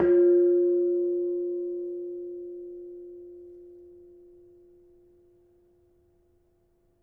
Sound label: Kenong, gamelan; gravityharps; timbrebox